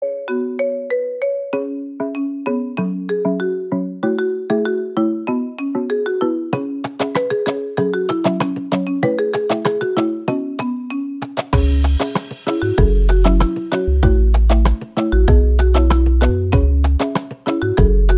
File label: Marimba iPhone